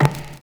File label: CABASS; Univers Sons - Kitch 70s